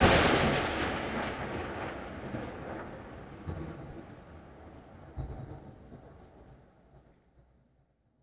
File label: Lightning Strike With Rain; Sony Pictures Sound Effects Series Volumes 1-10; Sony Creative Software